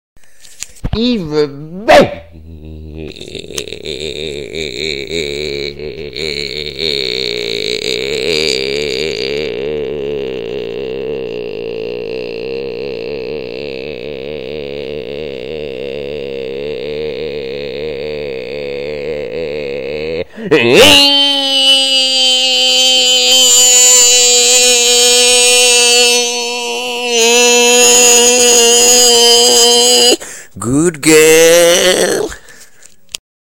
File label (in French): vocal